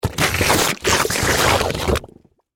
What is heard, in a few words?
Impact Suction Mud, Texture, Large; Objects